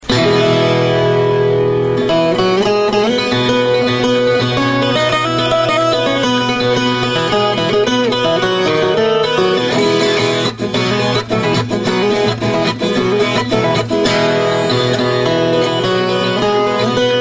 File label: viola caipira